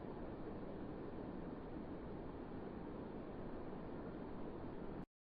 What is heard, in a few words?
woodpeckers